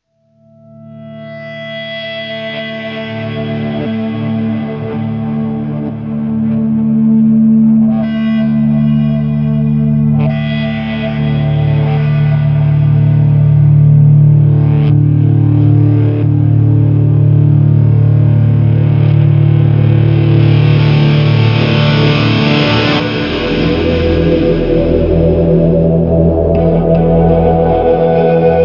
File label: music